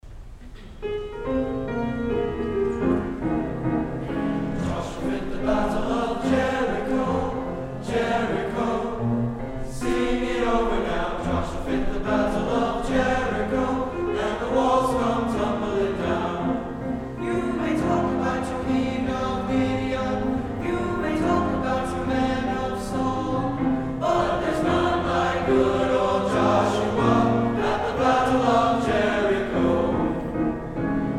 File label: Men's Choir; fall concert